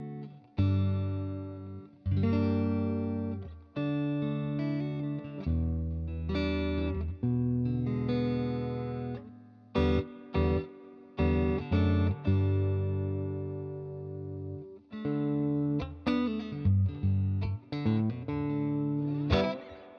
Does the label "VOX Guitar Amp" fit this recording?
Yes